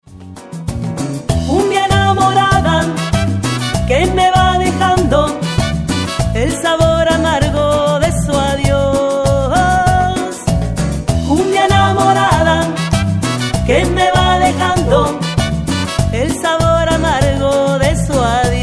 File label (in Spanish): cumbia negra cumbia enamorada